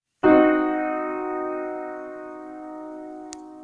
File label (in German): C-vermindert